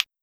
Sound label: Spoons, Metallic Percussion